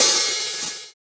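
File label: open hi-hat, Roland MV8800 drum kit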